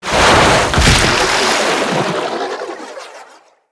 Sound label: Aqua Board Mount; Warframes; Yareli